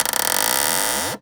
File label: Magnet Noise